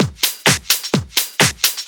Straight Loop 128 BPM, House

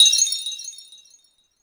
THANG, TEK PERC, Drums; TEK PERC3

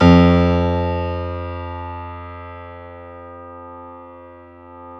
PIA GRDPI, Roland; Sound & Vision; Gigapack I CD 2; PIA Grand 5

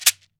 MARACA; AKAI S-Series CD-ROM Sound Library VOL-5; GM PERC KIT

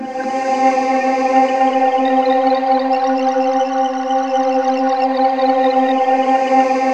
Korg M-1; GALACTUS; Rubber Chicken Software Sample Bank

